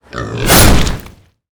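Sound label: guardian snake attack, monster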